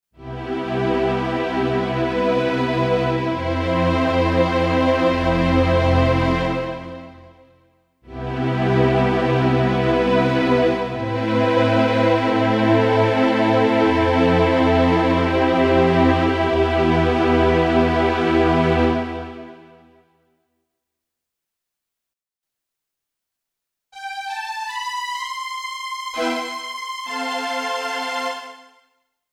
Yamaha YC88 Strings

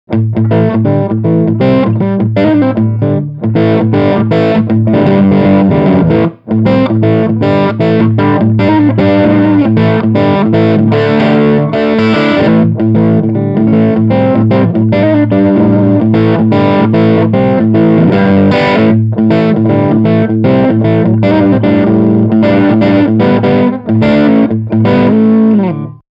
riff distortion

